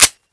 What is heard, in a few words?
p228 slide release, weapons; czero opforce